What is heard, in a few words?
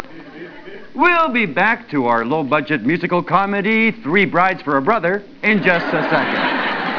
Musical Comedy